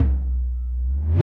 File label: EQ Perc, Percussion Mix